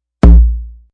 Bass Doing

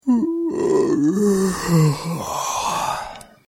Yawning, Male Yawn; Yawning Sound Effects; human sound effects

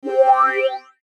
Sweeper Wah Swell; Production Element Imaging Element Accent Transition; Sweeper Sound Effects

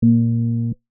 Scanner Beam Ray, Scifi; Science, Fiction; Tech; BlastWave

